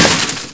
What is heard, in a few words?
CRASH, 碰撞声音素材